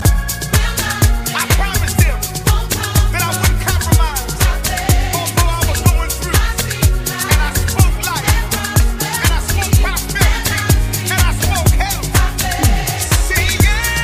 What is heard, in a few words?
Voc